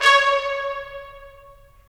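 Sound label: brass trumpets staccato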